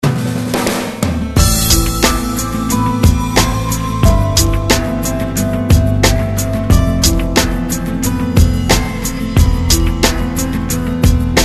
guitar test

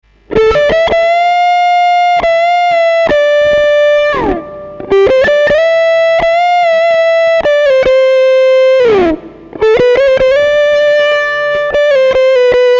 cool overdrive custom

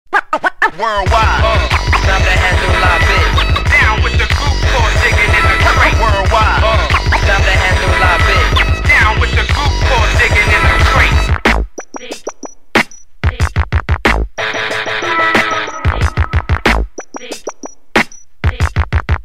remix instrumental

